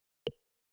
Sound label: movement sounds click out